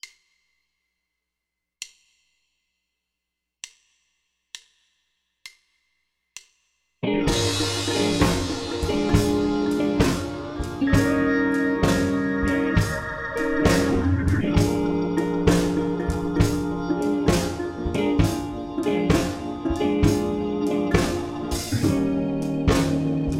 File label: Bb blues bass play along, jazz